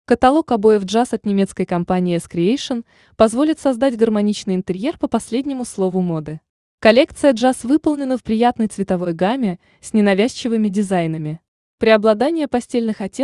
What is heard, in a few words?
jazz